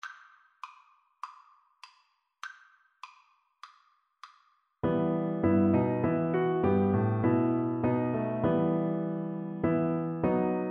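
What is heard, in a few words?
VLC karaoke, Cello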